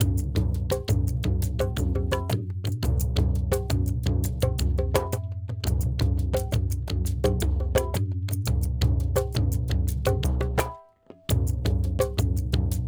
perc mix 085 with duff, Hip Hop India 085bpm; Hip Hop India Modern Percussion

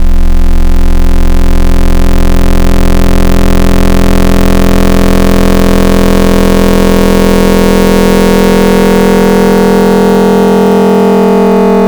Jagged Sine, FM Wavetables; Cymatics; FM Wavetables Vol 3; Serum

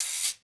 Percussion Hit; 100 Ebmin; Hip Hop Exotica; Big Fish Audio

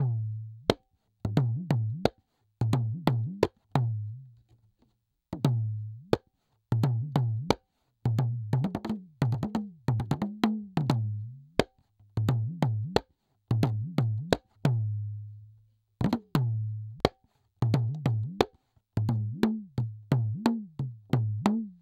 dimdi 088, Hip Hop India 088bpm; Hip Hop India Modern Percussion

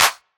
EDM Future Clap

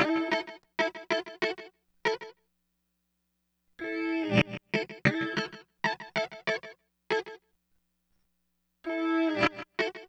Guitar; AppleLoops

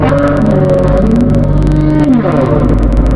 Whale, Animals Wavetables; Cymatics; Serum; Animals for Serum - Gold Edition

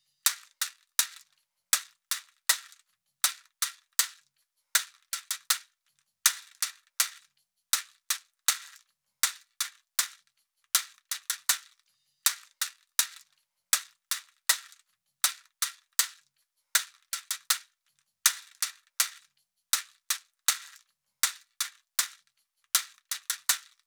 shaker B 080, Hip Hop India; Hip Hop India Modern Percussion; Apple Loops

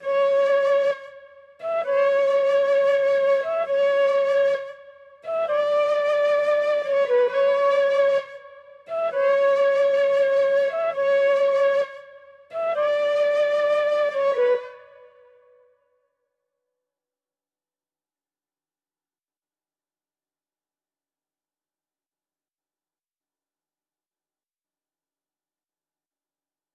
132 BPM F# Min Flute; Cymatics; Destiny Gold Expansion; Afterlife

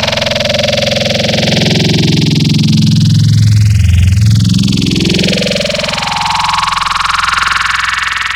Growl Table, Serum; Cymatics

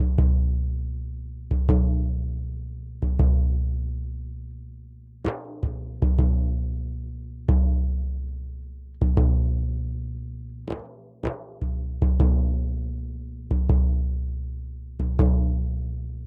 duff 080, Hip Hop India 080bpm; Hip Hop India Modern Percussion

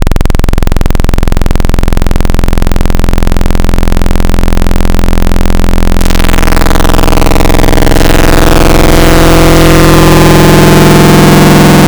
Saw Fm, Wavetables; Cymatics; Wavetables Collection Vol 3; Serum

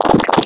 Scratch, Growl Wavetables; Cymatics; Virus Wavetables; Serum

